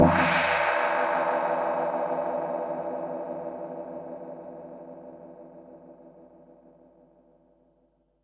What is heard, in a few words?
HH, Pearl SC-40 Hihats and cymbals